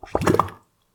Schlorp

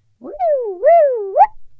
Cartoon Throw Away

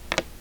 Setting Pencil Down

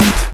Hard clap snare

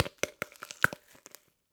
Bone Snap

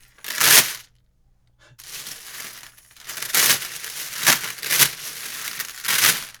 Metal Chains

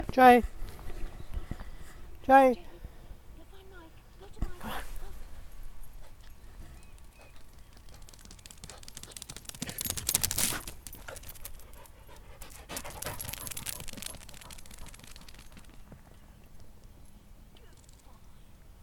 Dog running

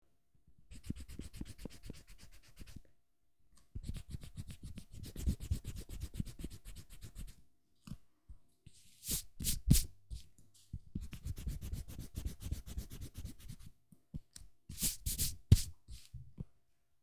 Erasing

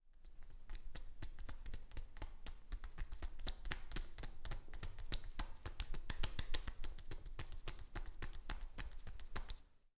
Massage

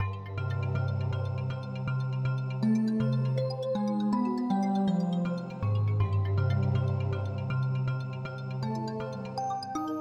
Xylophone Loop

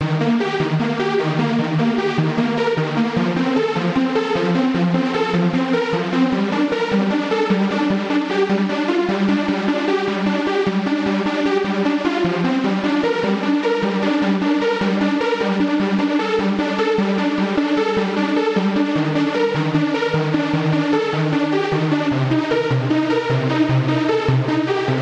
Lead

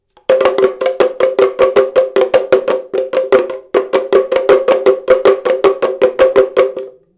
Spinning Drum

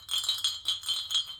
Jingle Bell Large

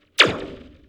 Blaster

